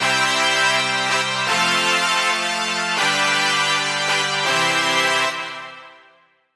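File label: fanfare and solemn award ceremony sounds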